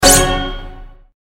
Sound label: combo; slice fruit